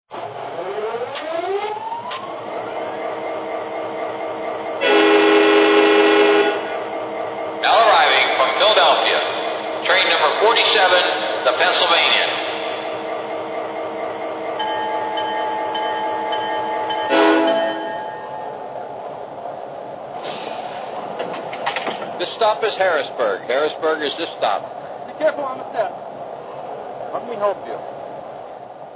f40ph; amt

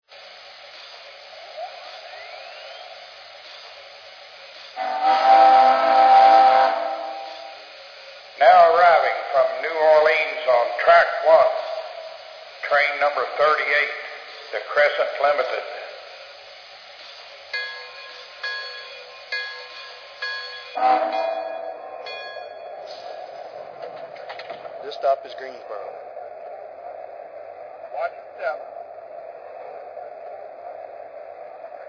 ps4

